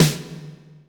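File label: REV SNARE; Greytsounds; Scott Peers Sound Engineering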